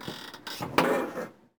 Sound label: Theater Seat Squeak Sit; SFX Kit